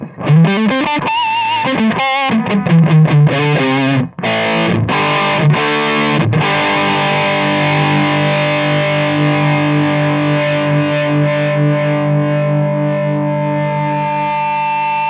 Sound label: 6watt 6V6